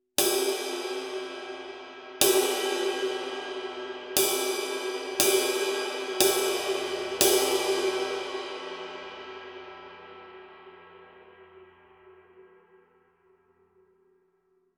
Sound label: Medium Thin High Bow, ride cymbal; 22" Kerope Medium Thin High Ride